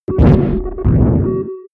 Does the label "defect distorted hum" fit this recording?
Yes